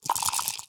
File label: dice play